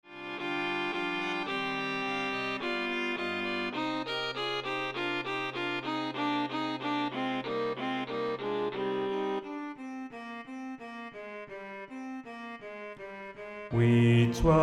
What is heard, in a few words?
soprano alti